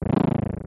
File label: blargg no echo; smw